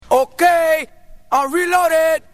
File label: RELOAD; DJ TOOLS